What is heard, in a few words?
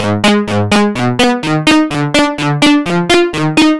Bass, 126bpm, Nu Dance Electro; Zero-G; Soundclash; Combi Loops; Die Laughing